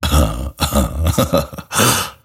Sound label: grimstroke laugh; dota